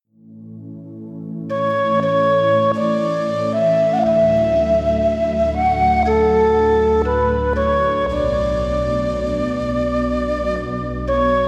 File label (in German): Entspannungszeit Melodien der Natur; wellness; Largo